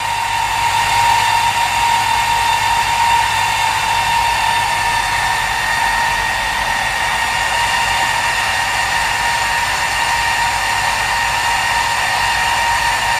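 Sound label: Petroleum Plant Whine, factory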